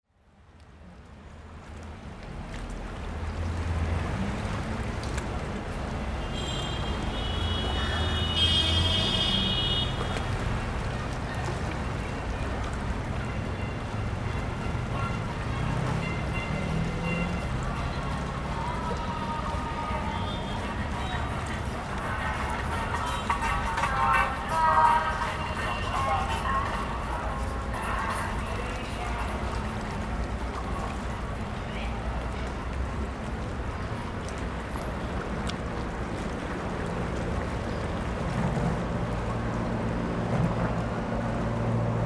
Embankment Soundscape

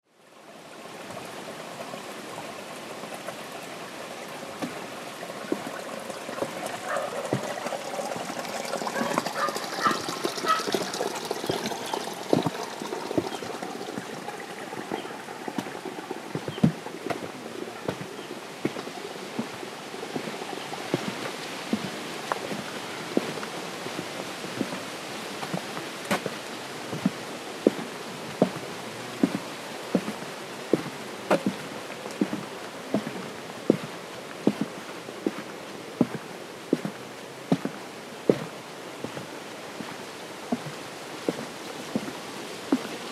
Doing the Boardwalk

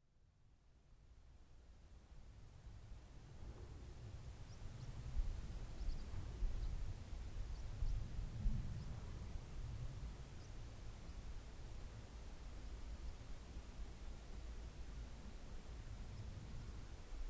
Penller Castell Soundscape

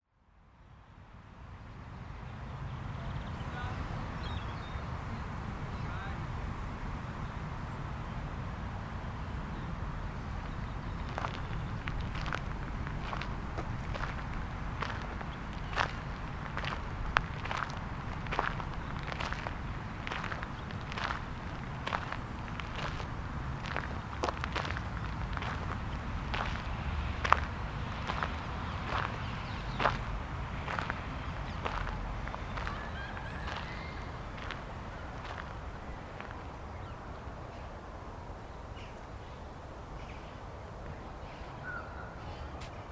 Hampton Walk Soundscape

